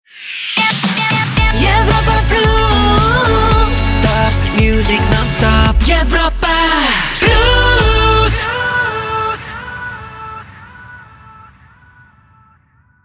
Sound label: Jingle; Europa Plus; Shotgun